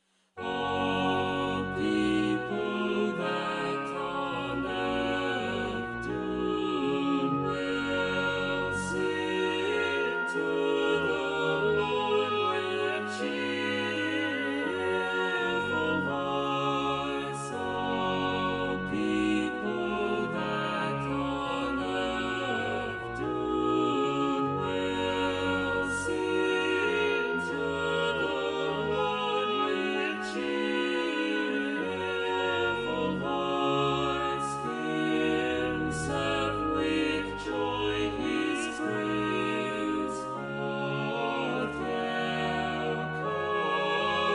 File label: Psalm Chorale